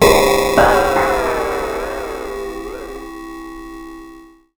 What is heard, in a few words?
Bit Crush & Moog Delay, Wire & Tin, contact mic; musicradar; contact mic madness